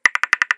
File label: Tactactac